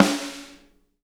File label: SNARES, EDG, Drum Kits